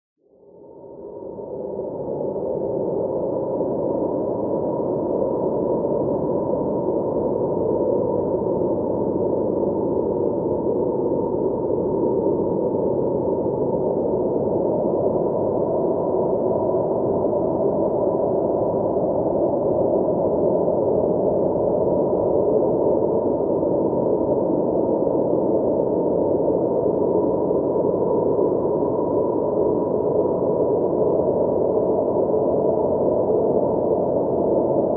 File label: nature wind howling spooky storm